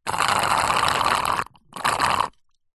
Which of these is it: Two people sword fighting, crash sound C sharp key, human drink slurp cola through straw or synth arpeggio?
human drink slurp cola through straw